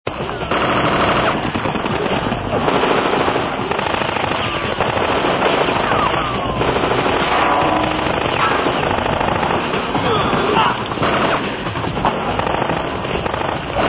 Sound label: Gunfire